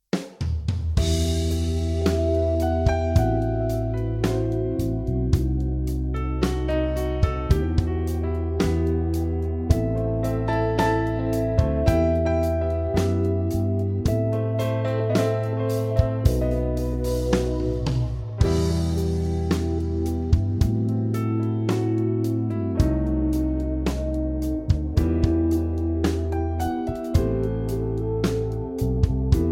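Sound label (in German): Kling Glöckchen klingelingeling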